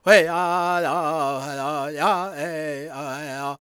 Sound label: C# MAJ, World Voices, LAKOTA; Spectrasonics Vocal Planet CD5